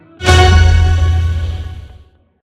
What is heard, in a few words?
Symbol Transform Chord; knight rider